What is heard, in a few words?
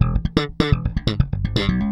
BASS X